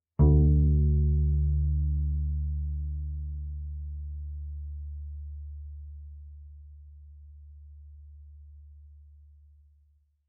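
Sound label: Bass pizzicato fortissimo sul D D2, Double Bass; MIS Pitches - 2014; Strings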